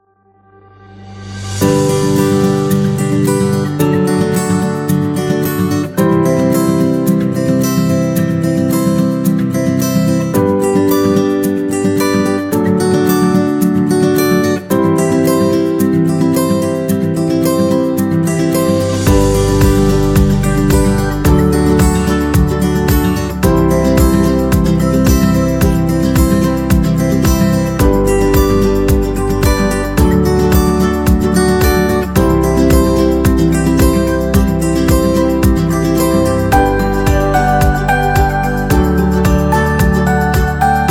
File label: Acoustic Upbeat